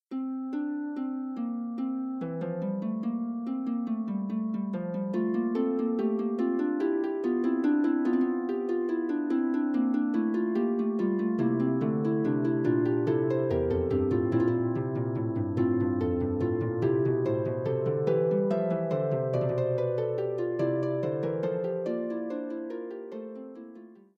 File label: Fugue, Harp